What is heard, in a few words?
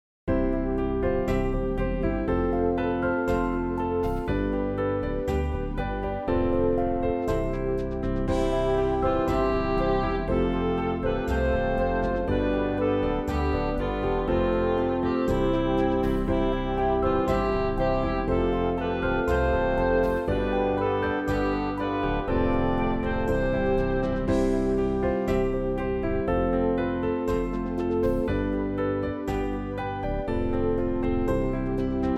instrumental; New Hope Music